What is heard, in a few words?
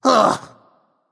nv ugh; smite; voicepack; ganesha